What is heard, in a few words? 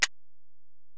Ac Perc, Drum Machines Alesis DM-PRO